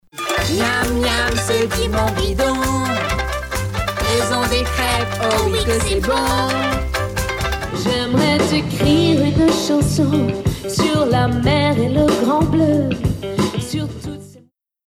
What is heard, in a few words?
female french sing comercial; ideasonora